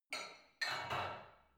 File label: barbell only replace unsteady reverby soft; sport freizeit; fitnessstudio